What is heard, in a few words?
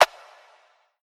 arp